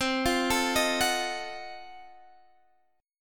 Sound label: F 7th Flat 9th C